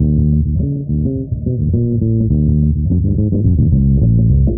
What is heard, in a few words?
bass, Loops, Phonky